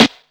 round steel snare drum sample a sharp key; Duration 0:01; Channels Mono

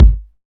strong bottom end steel kick drum g sharp key

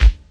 80s punk rock bass drum single hit a key; kick

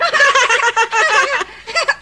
children laughing

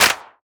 clap single hit g key; percussion; single hits one shots

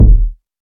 verby kick drum sound D# key; kick drum samples

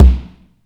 roomy kick one shot c key; Mono

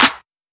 clap single shot c key; single hits one shots

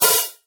open hi hat b key

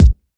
fluffy kick sample d sharp key; kick drum samples; one shots

